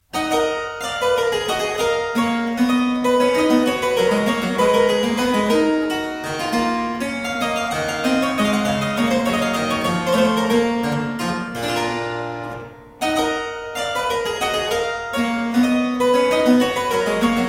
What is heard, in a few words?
Suite no 10 in E Minor - Courante, spoken; Johann Mattheson; The Twelve Suites of 1714